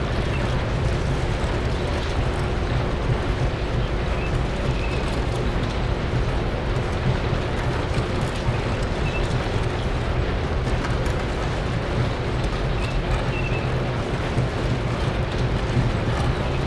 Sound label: Ambient